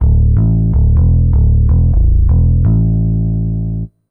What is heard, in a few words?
DEEPHOUSE BASS 125 A